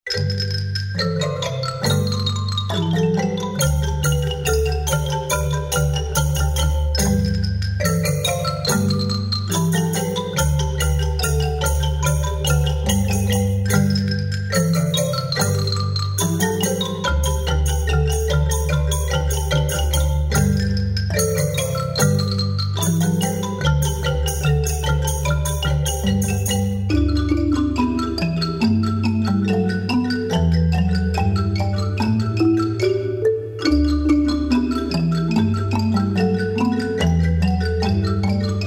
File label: Russian Dance in G, marimba